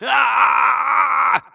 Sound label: grunt electro; Titanfall 2